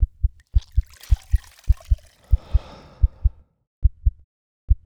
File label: health centre, complete; 0AD